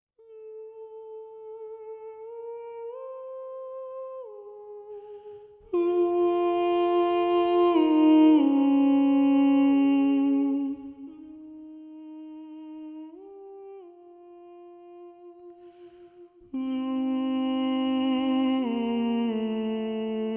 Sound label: Treble 1 Predominant; Senor ten pieded